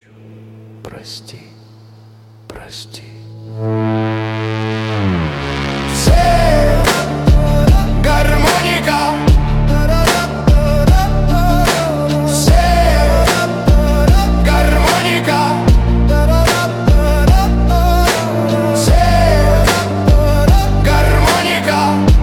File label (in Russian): garmonika